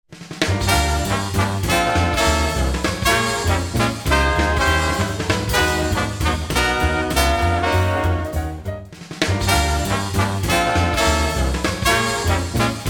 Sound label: Short Intro Music